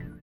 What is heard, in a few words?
VIBRA; Spectrasonics; Metamorphosis